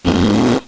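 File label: RASPBERRY, fart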